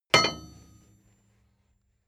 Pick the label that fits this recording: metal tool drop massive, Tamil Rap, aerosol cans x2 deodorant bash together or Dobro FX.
metal tool drop massive